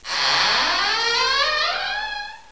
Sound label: squeaky; ambience